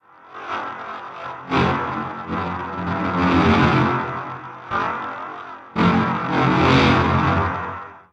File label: 2 noize; 耳障りなノイズ１; 思い出そうとすると記憶を遮断してくるノイズ？; ホラーボイス・声ネタ・効果音・ＢＧＭ